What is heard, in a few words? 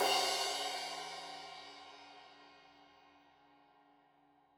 Crash 15in L edge, Cymbals Stereo